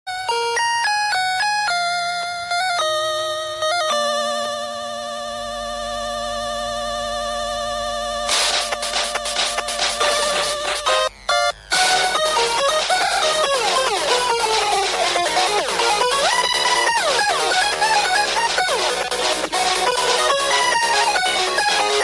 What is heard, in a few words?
Beat Drop